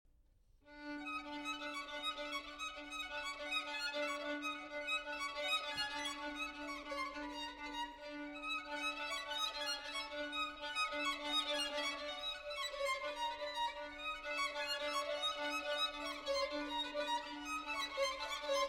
Solo Violin; Chants du Sud, Six Short Pieces; II. 2ème Chant

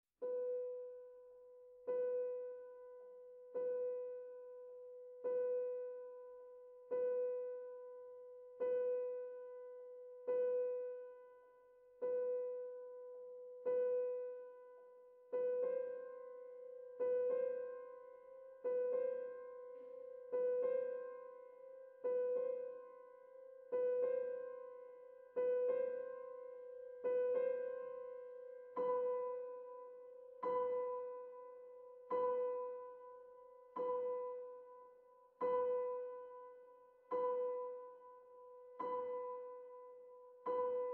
Lullaby For Piano

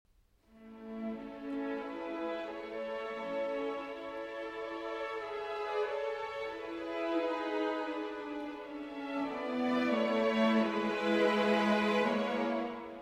Symphony in D Major, Larghetto

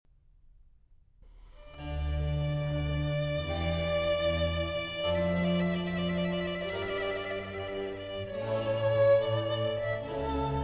Concerto For Violin and Strings in D Minor

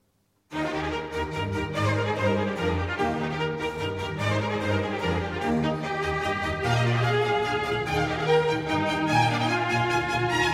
Trumpet Concerto in E-Flat Major, Vivace; Hertel Trumpet Concerto No. 1

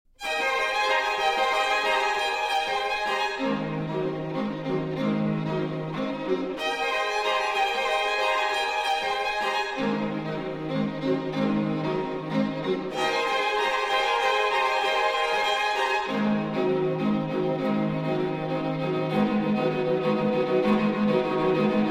String Quartet; String Quartet No. 5 III.

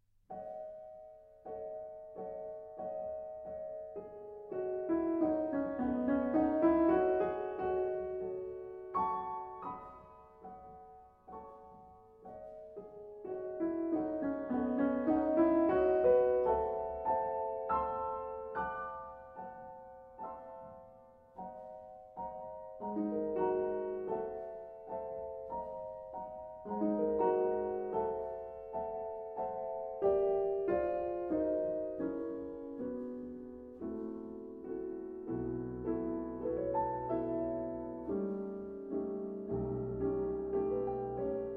Piano Pieces; 7 Piano Pieces, Op. 27; Spring; No. 4, Toukokuun Yö

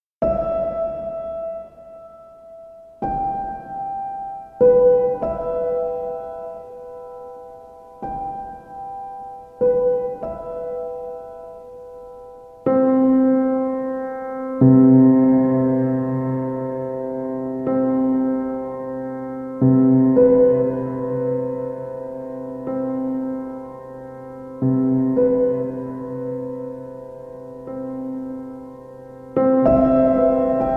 Piano Sounds

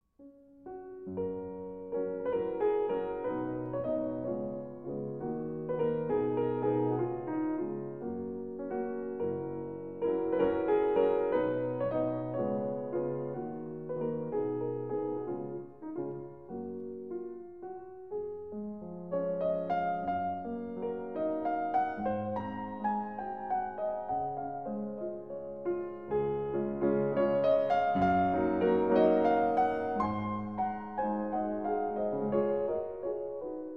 Nocturne in C-Sharp Minor

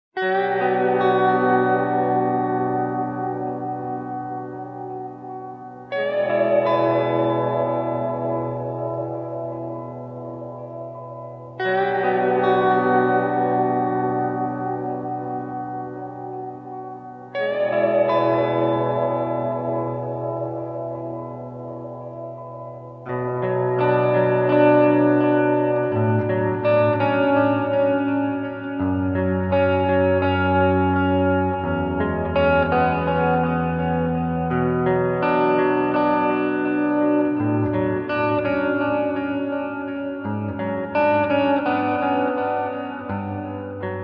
Crying Wind

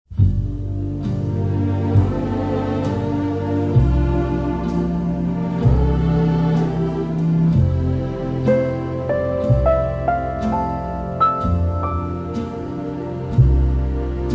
Piano and Strings

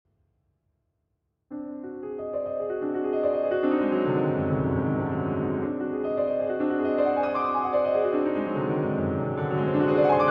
Solo Piano

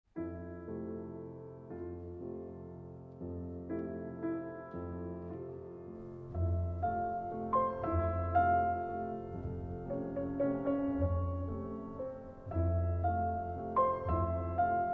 Waltz

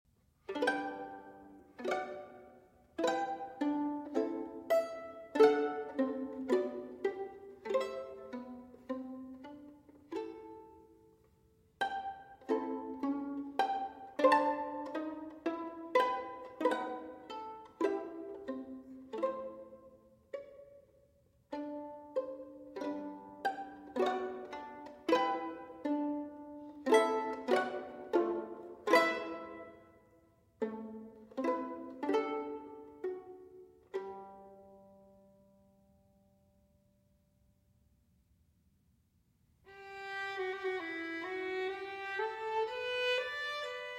Sonatas For Violin Solo, Sonata No. 2 in A Minor; Ysaye; Op. 27

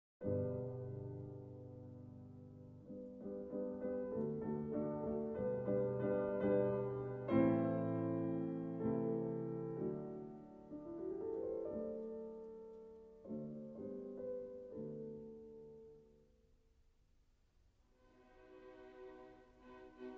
Piano Concerto in G Major, Allegro Moderato; Beethoven; No. 4; Op. 58 I.

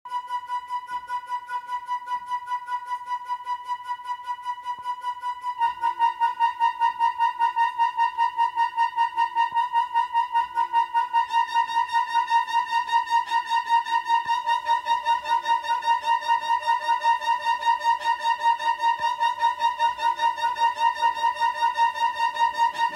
Orchestral Version

